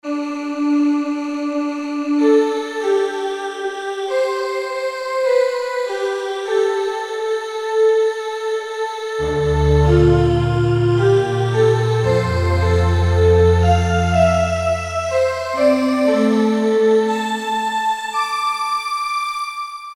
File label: Synth Vox, Roland U-220; Factory Patches